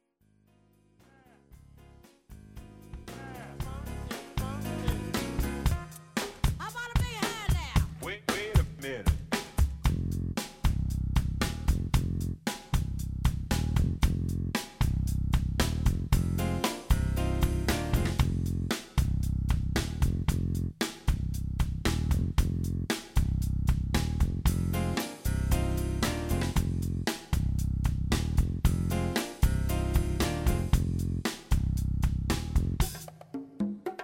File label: Hip Hop